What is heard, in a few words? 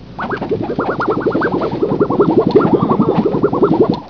metal wobbling